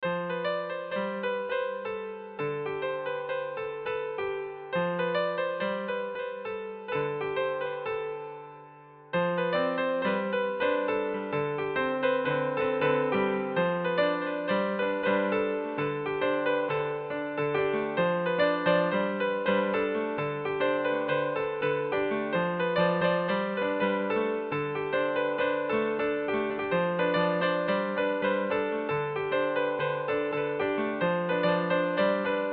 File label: Armenian melody